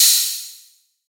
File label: low treble open hat one shot c key, Royality free open high-hat sample tuned to the C not